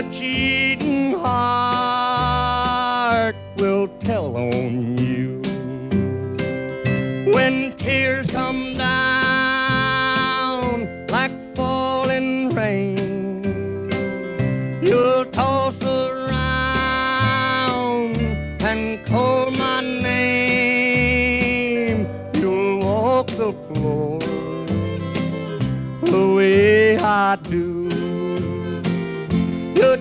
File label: country